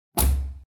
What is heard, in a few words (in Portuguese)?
Carro Porta Batendo